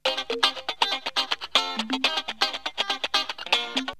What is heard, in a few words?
Melody loop; ZERO G - Datafile DISC 1